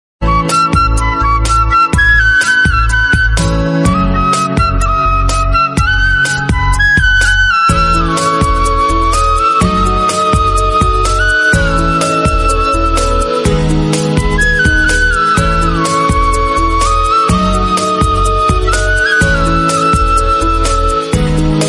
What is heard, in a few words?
instrumental old is gold instrumental ringtone romantic ringtone